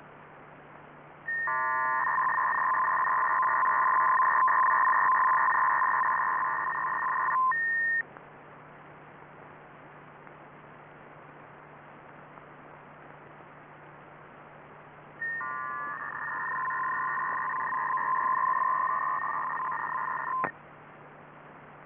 YUG266 TYPE1, Yugoslav/Serbian MIL 266.67 Bd FSK burst system; YUG-MIL 266.67 Bd Type 1; YUG-MIL 266.67 Bd BURST SYSTEM (MEROD?); FSK-systems